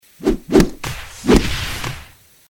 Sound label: attack; hero